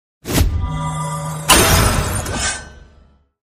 card Appear

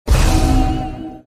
skill bullet; funerary buddha

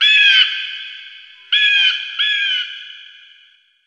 open mail npc

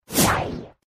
skill bullet; destroyer

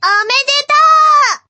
win; fight Result

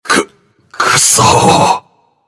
die; emperor

